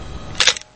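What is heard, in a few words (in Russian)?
звук съемки фотоаппарата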